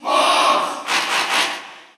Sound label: Cheer Russian; Fox; SSBU